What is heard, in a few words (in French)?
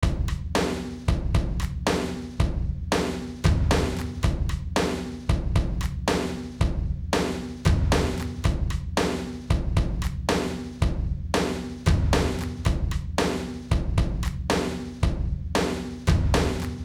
batterie